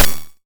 Mid Perc, Data Mosh Hits; Snare - Clap - Mid; 99Sounds; Mangling Audio

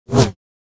Saber; FXHome

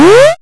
pickup; Kenney's Sound Pack; Retro Sounds 1

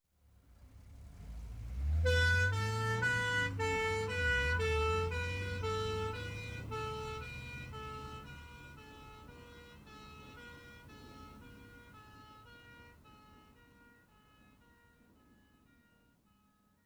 Ambulance Departs With Two-Tone H; Emergency; BBC Sound Effects Library

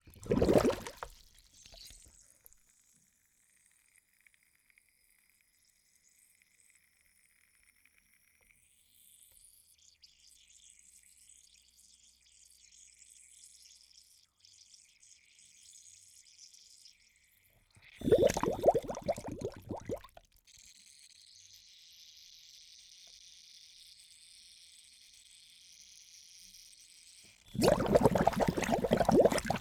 Bubbles regulator submerged, Scuba Diving; stereo; underwater diving; Ivo Vicic; AKG

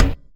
impact Plate heavy; Kenney's Sound Pack; Impact Sounds

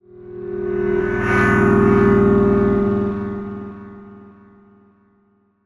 swell mixed

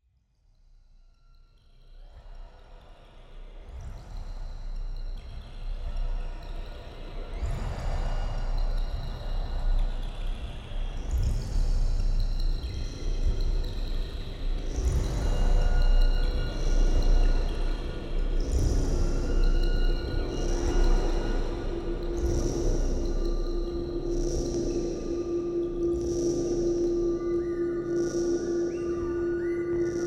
Magnetic Fields

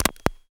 switch button click high tech mechanical; Bluezone; Switch And Button Sound Effects

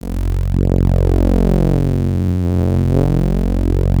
Bass drone F, Circuitbend; Drones - Bass; 99Sounds; Mangling Audio

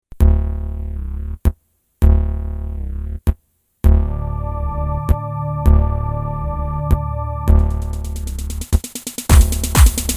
Electronic Cheesy Action; Unclassified Electronic

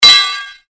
Sword Sound; FXHome; Fighting Sounds

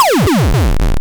gameover; Kenney's Sound Pack; Retro Sounds 2

